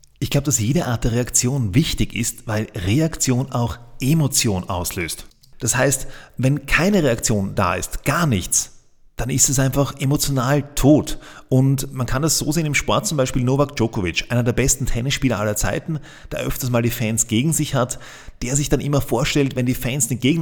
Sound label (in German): applaus